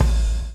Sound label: BLOW; Spectrasonics - Backbeat